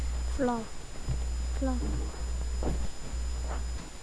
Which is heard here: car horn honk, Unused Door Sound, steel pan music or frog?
frog